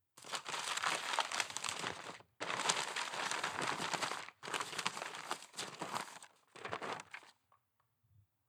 Paper Rustling

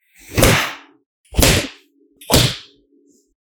Three Facepunches

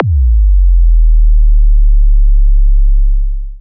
Kick Subwoofer Test

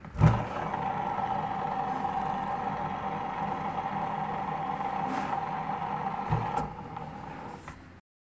Skateboard Wheel

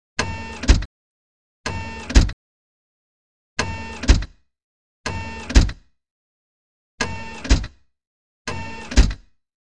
Tripod Leg Step; Wotw 2010

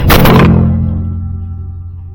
Impact On Metal

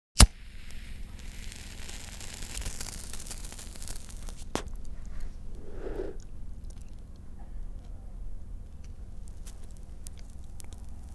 Consuming Cigarette

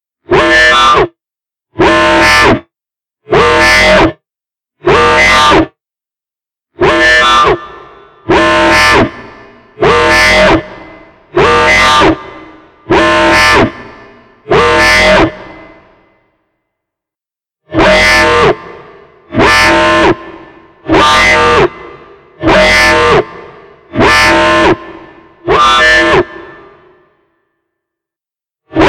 Dirty Yellow Alert

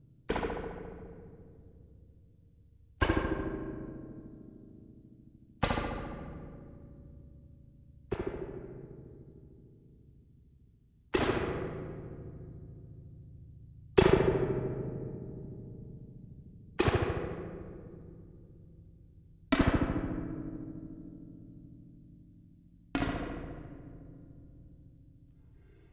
Left Right Allen Keys

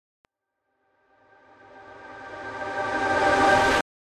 Shadows Reverse C Minor

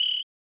Whistle Fx

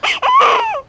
Baby Birth Cry loop

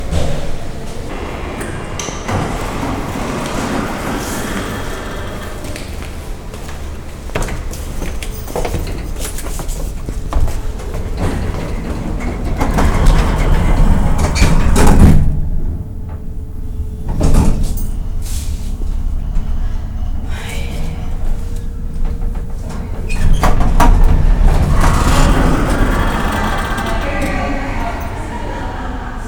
Coming Into An Elevator

